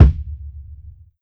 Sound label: One Shot Boom Kick